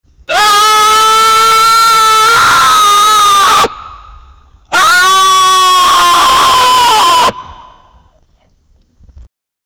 man screams very loud ohh; gross

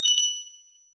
notifications chime synth

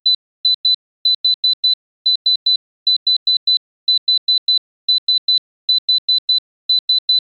electronic device beeping repeatedly; electronics

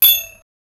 one clean metallic bead, pinball

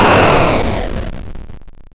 explosion for a 16 bit

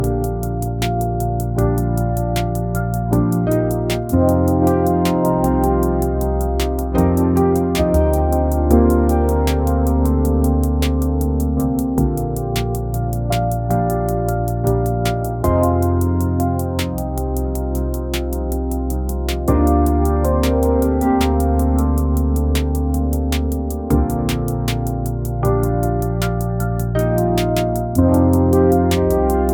smooth lo fi chillhop wit

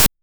tick for options select, game sounds

